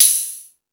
Space Drums, Drum Machines

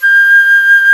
Flutes, Flt amb; Roland LCDP04 Orchestral Winds; 90 s Sample CDs; C Flutes 3-8

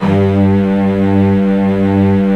STRINGS

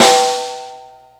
Ring Snare, Snare One-Shots; KJ Sawka; Drum n Bass & Beyond; Drum Tool Box

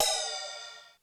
Ride, Korg DSS-1 Drums

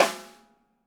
DW Green Maple Timbali Snare; Sonic Foundry (Sony Creative Software); Vital Drums

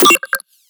synth hit Computing Noises; Virtual Riot

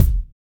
Kick, Kickdrum; Equipped Music; Loungin House

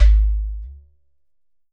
GHATAM

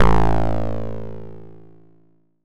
Buzz; Bitkits

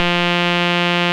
rave beep F2; Best Service Alpha Dance vol.2

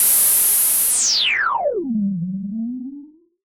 BassStation

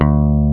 D2 PICK BASS

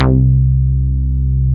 Tekkno Bass; Best Service Gigapack 2

